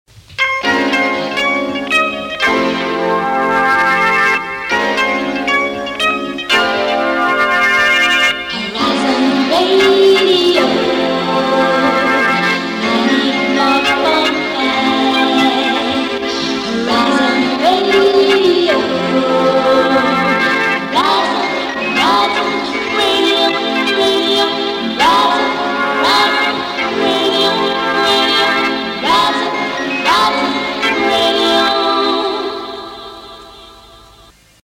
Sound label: Radio 94.5 with SYNTHS; Horizon Radio; Horizon Radio Jingles 1981 to 1985